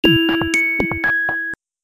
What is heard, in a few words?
melody